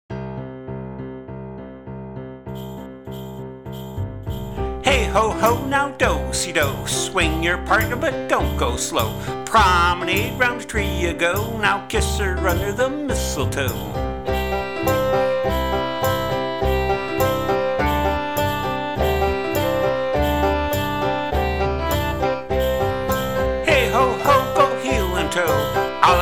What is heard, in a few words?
Hoedown